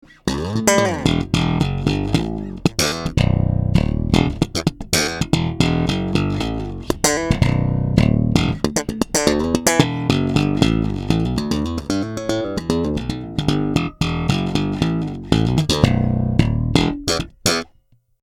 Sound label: Arona 5 Slap